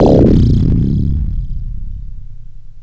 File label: EXPLO MIX; WATERFN